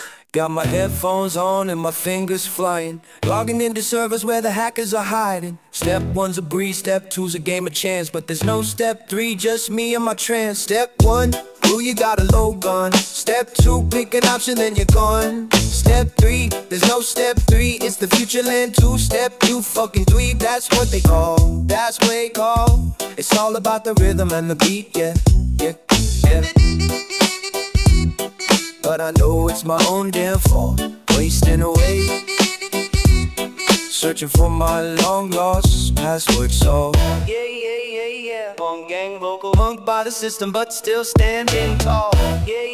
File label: BoomBap HipHop